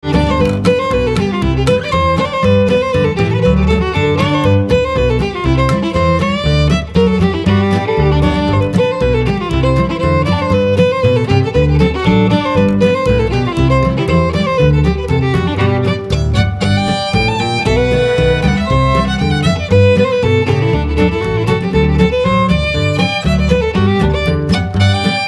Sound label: violin guitar & bass